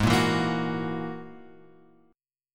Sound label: G sharp 7th Suspended 2nd Sharp 5th; G#7sus2#5 Chord {4 1 2 3 X 2} strummed